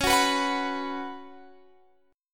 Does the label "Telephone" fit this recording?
No